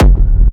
industrial techno kick oneshot - f, Nicely shaped, crispy and reverbed industri; kick drum